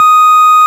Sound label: ORG, Farfisa Clarinet